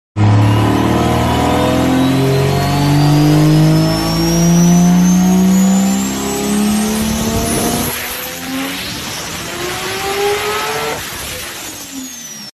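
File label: Nissan Skyline, car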